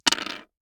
DICE on Hard Wood, Throw and Roll, Standard, Three Dice; Samples; ArticulatedSounds; DICE Sfx Party (Extended)